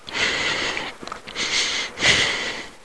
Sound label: gasp